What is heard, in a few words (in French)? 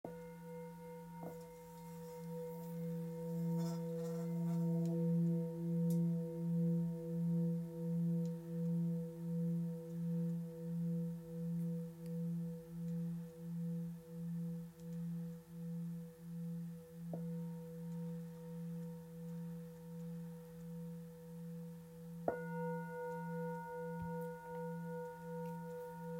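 Bol full moon la diese, bol chantant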